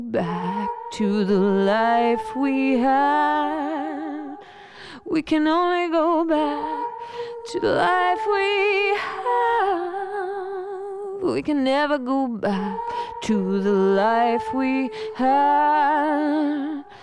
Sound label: mixture, singing librispeech; MedleyVox